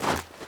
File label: snow; firefight